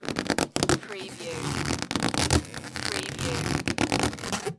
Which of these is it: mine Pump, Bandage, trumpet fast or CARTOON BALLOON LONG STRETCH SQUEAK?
CARTOON BALLOON LONG STRETCH SQUEAK